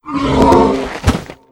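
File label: Npc Crow Damage; FlyForInsanity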